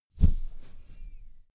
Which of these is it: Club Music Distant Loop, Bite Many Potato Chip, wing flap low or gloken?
wing flap low